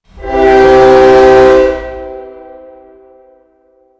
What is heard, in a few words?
train horn